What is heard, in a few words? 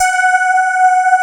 STRIA, Twisted Textures; STRYNGI